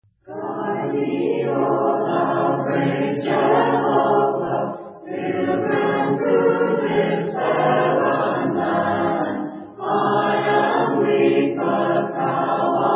a capella hymn; guide me o thou great jehovah

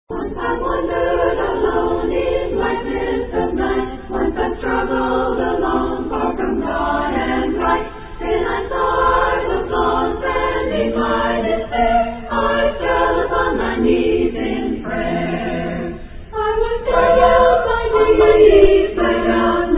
a capella hymn; on my knees

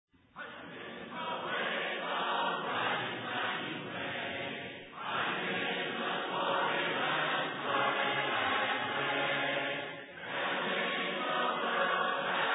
a capella hymn

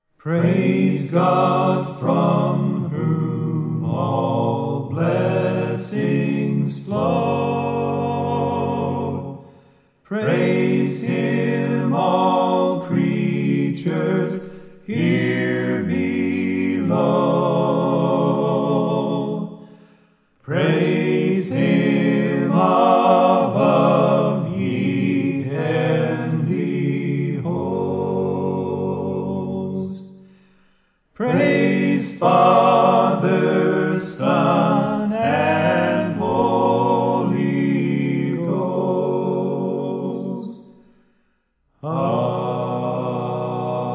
a capella hymn doxology

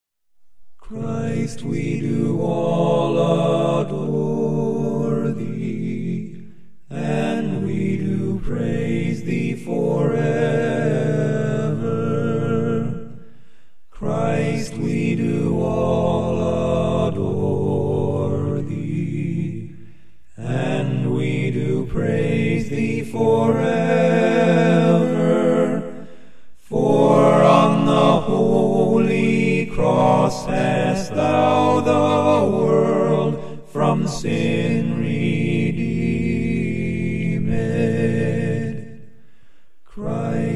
a capella hymn; christ we do all adore thee

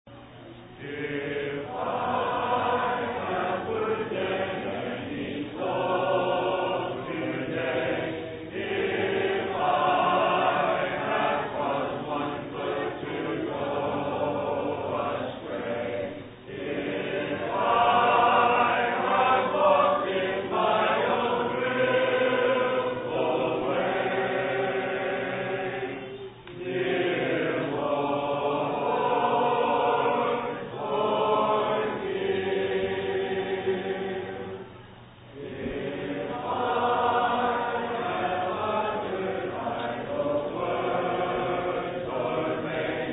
a capella hymn; an evening prayer

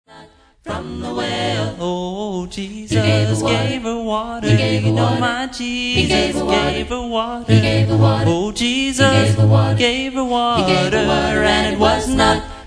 a capella hymn; he gave her water

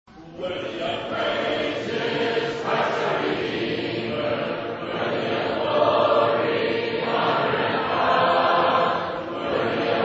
a capella hymn

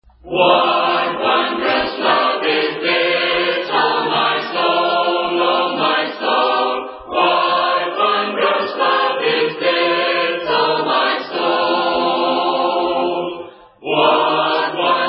a capella hymn, what wondrous love

